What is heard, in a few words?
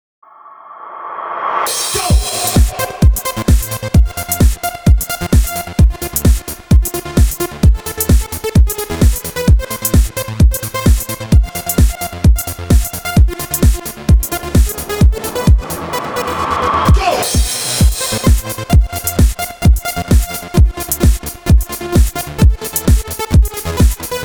Elektro